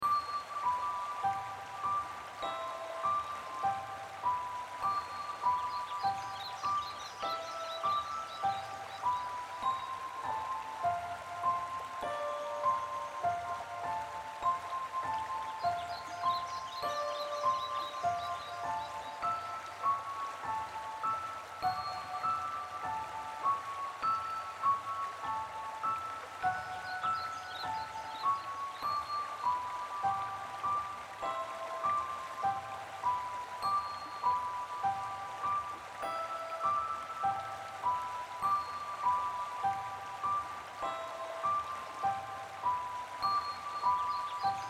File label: piano Nature